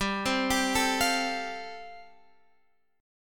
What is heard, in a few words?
G 7th Suspended 2nd Suspended 4th